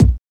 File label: mid heavy kick sound b key